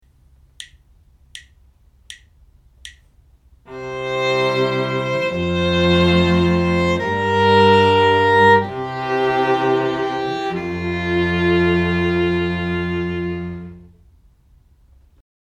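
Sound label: harmonic error detection, string quartet; ear training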